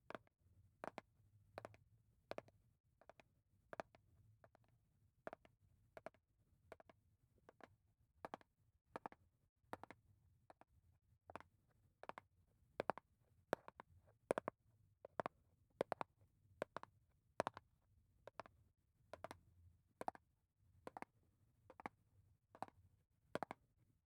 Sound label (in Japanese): ネイル タッピング